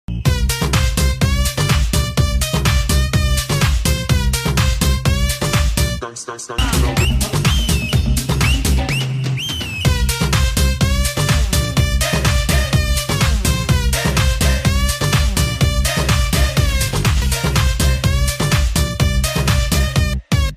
song bgm ringtone

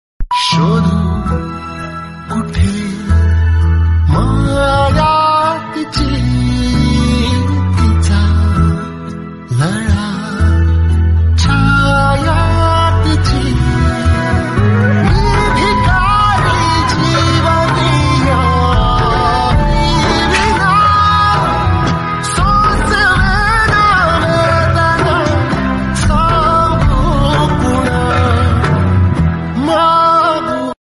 marathi ringtone

